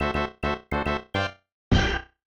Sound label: Fanfare; SMAS; SMB